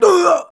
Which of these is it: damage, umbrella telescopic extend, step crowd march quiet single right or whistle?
damage